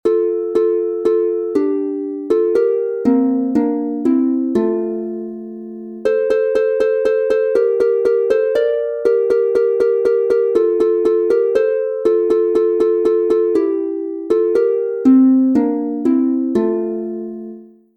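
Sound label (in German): F Dur 2 stimmig instrumental, Harfe; Heut kommt der Hans zu mir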